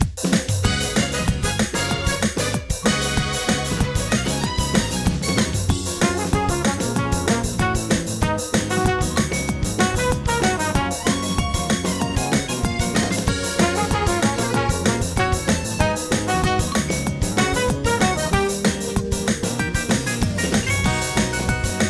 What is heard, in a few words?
Super Bell Subway Final Lap, Frontrunning; Mario Kart 8